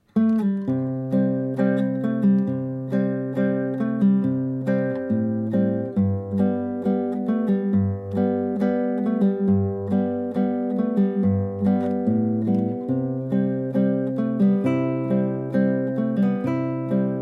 waltz

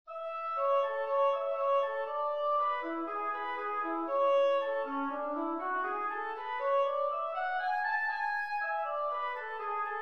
scale study; lewis alastair

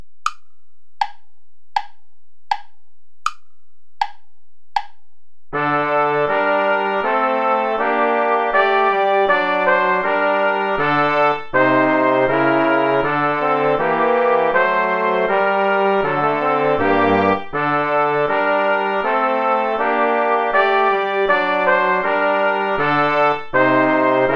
play along without trumpet; bach johann sebastian; ach gott vom himmel sieh darein

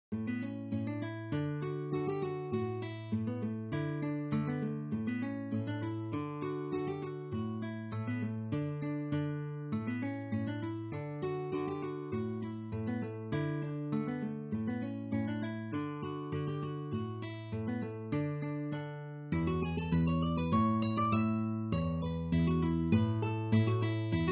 schottisch; Blue Bird

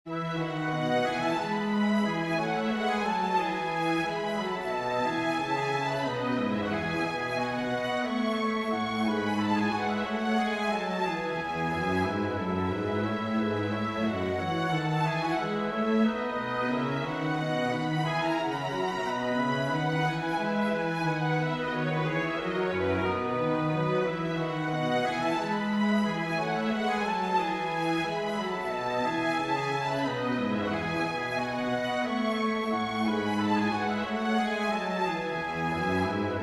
for winds, strings; Constant lovers never roving

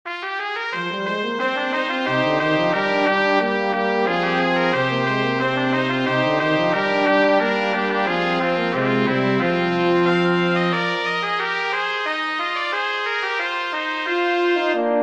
for brass quartet; passereau pierre; est bel bon

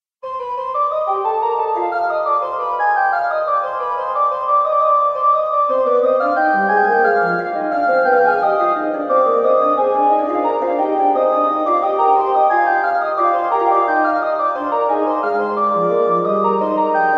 inventions a 2 voix orgue; bach johann sebastian

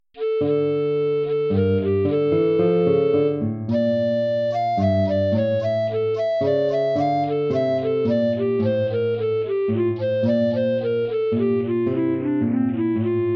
courante

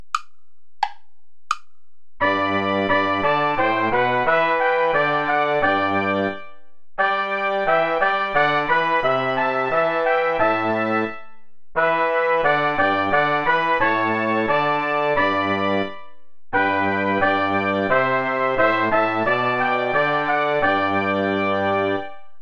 play along without trumpet; goudimel claude; sus serviteurs seigneur

